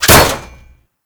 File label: Radial Blast Impact Metal; Warframe; Excalibur